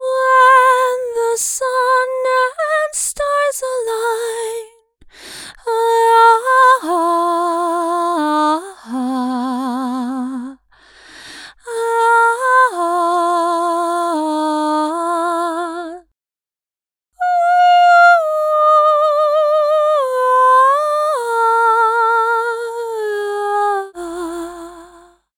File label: Top Line Vocals Outro - Fm 80Bpm; Construction Kits; Ghosthack; SkyRealm; Kit 08 - Into The Light